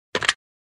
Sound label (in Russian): phone